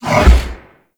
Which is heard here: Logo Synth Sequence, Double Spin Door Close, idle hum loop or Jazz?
Double Spin Door Close